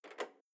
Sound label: HAPTIC SLIDE UNLOCK; Sharp AQUOS wish4 SH-52E; sharp audio